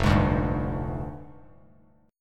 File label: F Minor 6th Add 9th F down Bass Standard; JGuitar